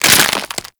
Stealth Neck Snap; Warframe; Characters; Tenno

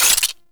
Zoom In; Vulkar; Grineer; Female Sniper Rifle; Weapons

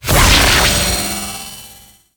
Invisibility Enter; Warframe; Infested Lich

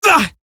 pain, female; Warframe; Eximus Grineer Female; Battle Barks

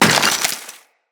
Melee Impact Wood, Weapons

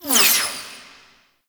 Laser Fire Whiz By; Warframe; Tau; Sentient Dactolyst; Weapons

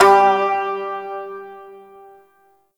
Shawzin Oct One G; Warframe

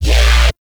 Alt Fire Growl; weapons; Warframe; Infested; Arm Cannon; Coda Bubonico

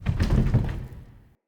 Bookshelf Move Stop; Movers

